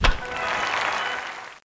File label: Sticker Place Sound; Coco Sticker Sport; Fisher Price Ready for School Kindergarten